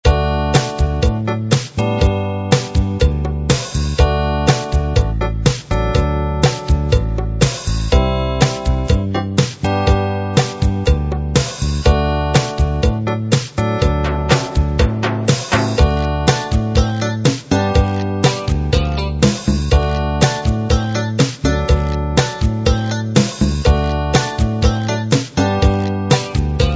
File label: pop